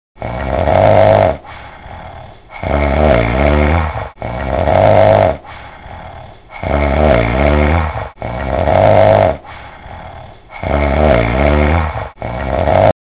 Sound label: Snore; Retro Ringtones